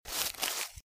Footsteps - Bush walk - Left; Eden; RadwinIsland

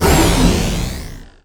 Energy Activate; Energy & Forcefields

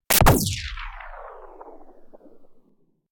Gun Shot Small; Hollywood Edge; sound designer's toolkit v2

